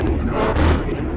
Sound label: Dark Loop, Sound FX